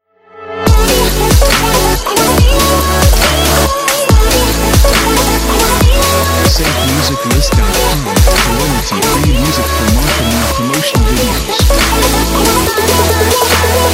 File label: Future Bass Intros; SafeMusicList